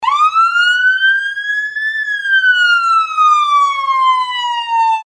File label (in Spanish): sirena ambulancia